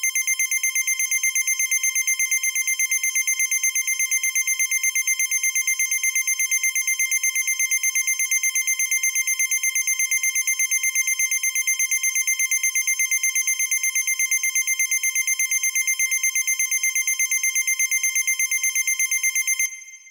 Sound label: telepathy